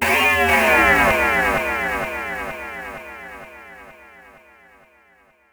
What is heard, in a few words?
Disappear